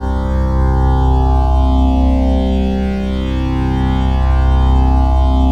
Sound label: PHASE PAD, ATMO PADS; Best Service; XX Large